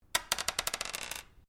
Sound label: Dice Small Pair Glass Table Throw; Variety of dice sounds; Casinos